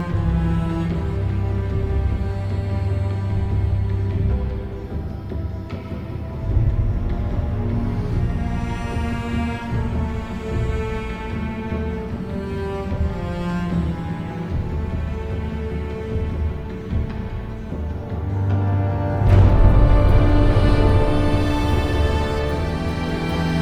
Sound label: defeat loop 75bp; LoL; howlingabyss